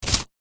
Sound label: stone small scrape in dry mud dirt; foley